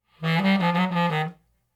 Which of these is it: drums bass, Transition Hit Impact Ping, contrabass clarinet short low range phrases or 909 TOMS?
contrabass clarinet short low range phrases